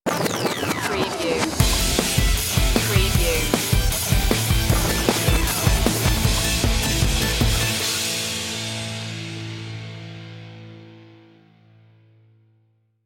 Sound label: crash course stinger